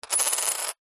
money australian 20 cent coin set down on tiled table spin; foley